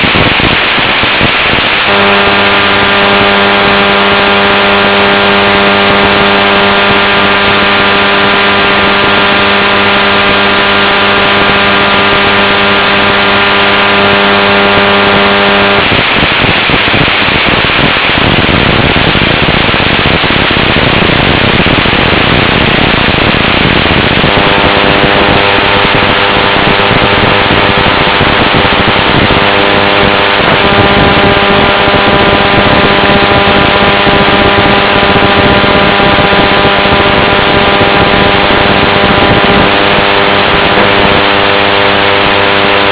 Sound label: dect, Scan samples of different Transmitter types